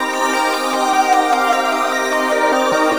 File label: SYNTH D+B 160 C, Drum+Bass, Keys; eJay